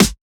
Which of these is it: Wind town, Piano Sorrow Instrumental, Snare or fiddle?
Snare